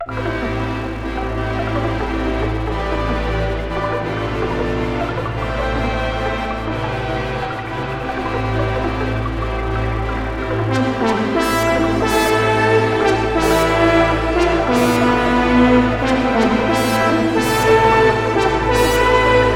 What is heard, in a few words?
Fanfare